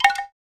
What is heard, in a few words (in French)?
bambou mel